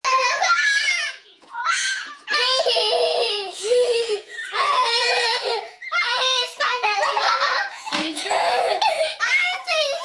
children delighted at christmas

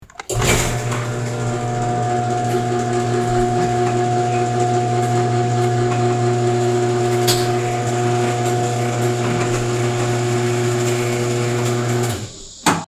garage door opening

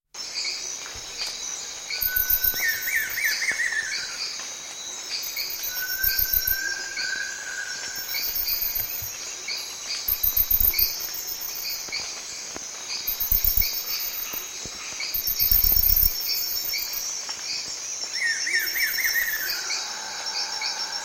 rainforest; bbc

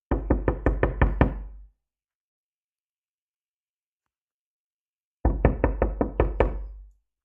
knocking on door

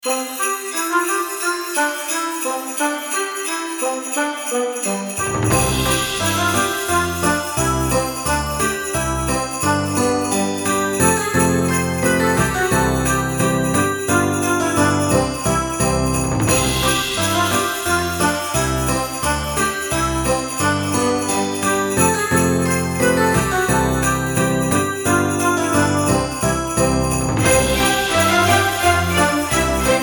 christmas celebration music holiday

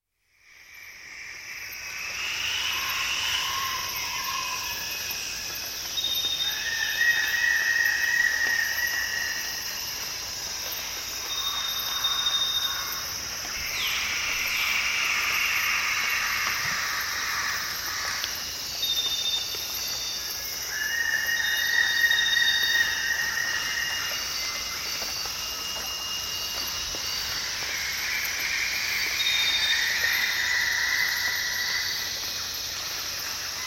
forest wallace's; bbc

